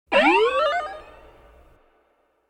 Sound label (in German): Science Fiction Sich Aufbauendes Energiefeld